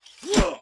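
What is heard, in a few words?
infantry sword, weapon; Battle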